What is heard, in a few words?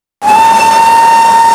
whistle 4 board b; castle class